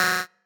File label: marimba bike